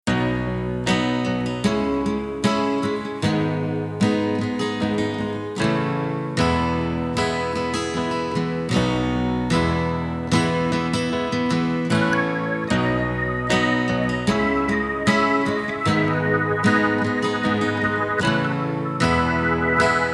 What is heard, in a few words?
Instrumental